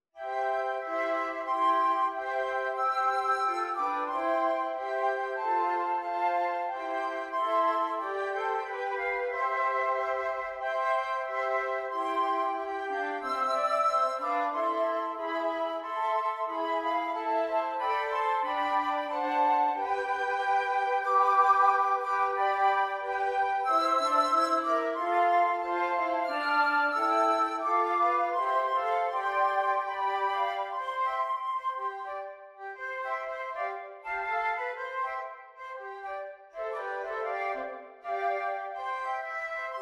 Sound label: Flute, jazz arrangement; angels from the realms of glory